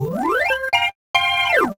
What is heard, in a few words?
Rock Organ-phrase; puchicon; dsi ds